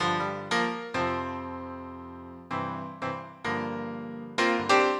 PIANO 96 G; OLDSCHOOL; Keys

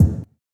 One Shot, Hits; Touch Loops; ElementsOne; Abstract Rhythms

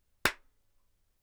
Snares & Claps, One Shots

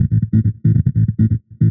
TECHNO BASS 140 A

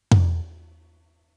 Rack, Kit; One Shots; Touch Loops; ClassicBreakSessions

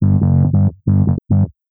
TECHNO BASS 140 A

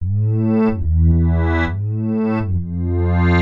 SNTH BASS TEKNO 140 A

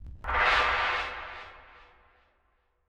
FX Industrial 125

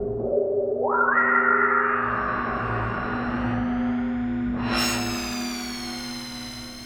SPACE SHIPS; SpaceSounds

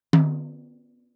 Toms; Touch Loops; CosmicFunk

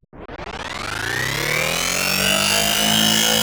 TECHNO EXTRA 140

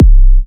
Minimal Kick, Drums, Kicks

